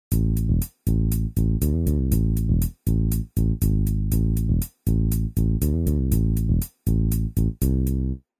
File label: vib mod, bass